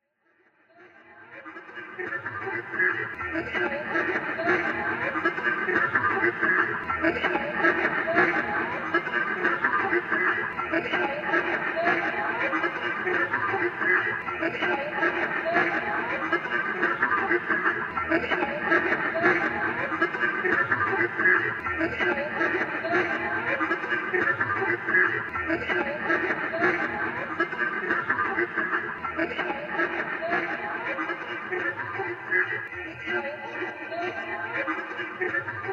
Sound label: ducks unfiltered